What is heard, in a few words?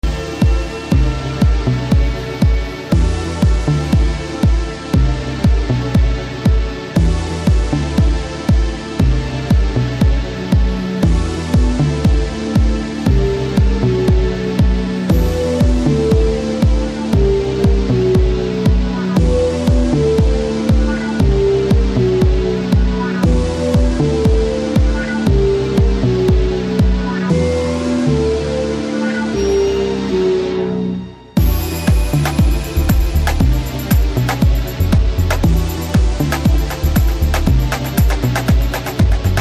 Morning Blues